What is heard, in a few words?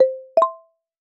accept, groupcall